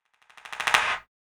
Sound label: CLAP FX